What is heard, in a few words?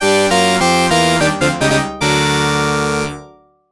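background music; The Speed Rumbler; Capcom Classics Collection Remixed; PSP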